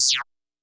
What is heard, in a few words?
kit, Yamaha Motif-ES8